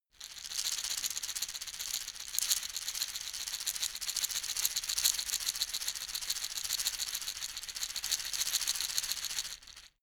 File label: shaker wood rattle fast soft